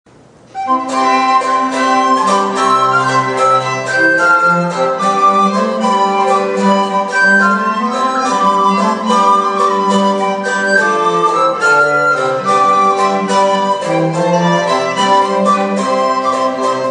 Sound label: Courante